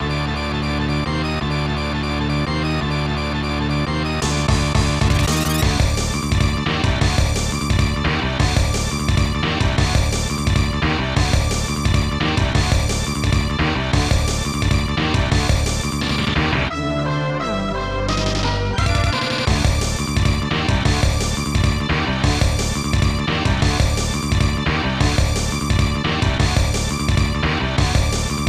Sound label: Magic Bell